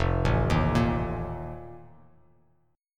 F 11th F, down, chord; F11 arpeggiated

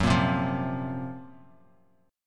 F sharp Major 7th Flat 5th F sharp, Bass Standard; 14,13,10,10; strummed

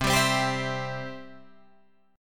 C Major, Guitar Drop D

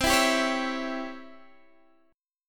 Db Minor Major 7th Flat 5th C

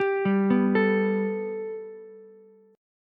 G Major Add 9th Ukulele C6, chord; 12,7,7,12; Gadd9 arpeggiated

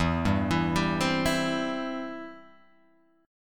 E Minor Sharp 5th E down Guitar Open G

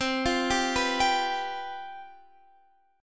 C Major 7th Suspended 4th, down, Guitar, Drop D

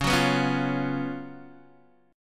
F sharp Major 7th Suspended 4th, C sharp, chord

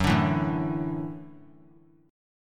F sharp Minor 7th Sharp 5th F sharp; strummed; JGuitar

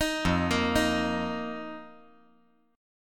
F 7th Suspended 4th F down

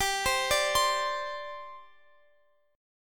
G Suspended 4th, Guitar, Open F; Gsus4 arpeggiated; x,x,19,19,17,19

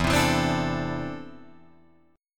E 7th Suspended 4th Sharp 5th, Guitar, Open F

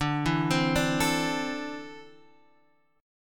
D 7th Suspended 2nd down, chord; D7sus2; arpeggiated

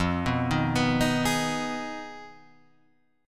F Minor 7th Flat 5th F down Guitar Half Step Down; Fm7b5

